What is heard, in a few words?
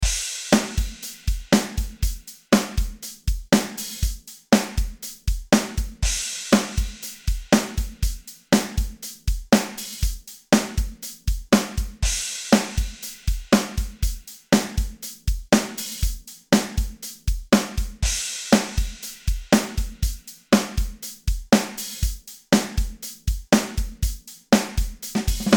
drum; AirEQ Premium Plugin von Eiosis